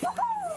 wouhou

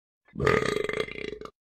burp

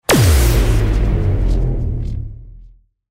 power down

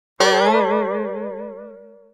toinkz

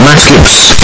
boost

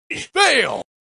fail

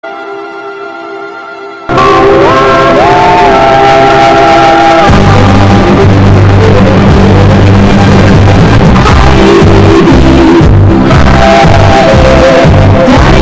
hardbass

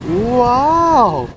waaaooooowww

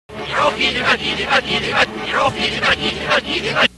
jingle backwards

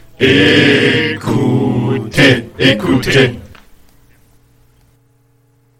jingle; 8 spuren

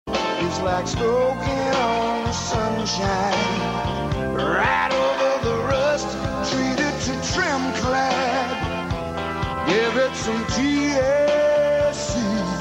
paint commercial with the song; tremclad

